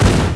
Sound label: Crash